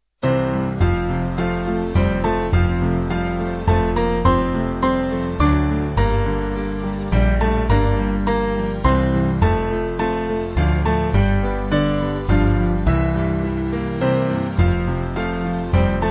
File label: Band